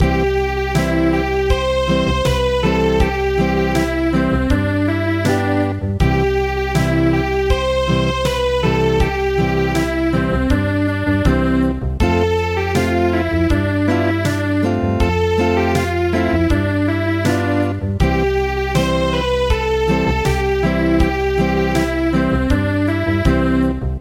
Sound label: Acoustic; midi; traditional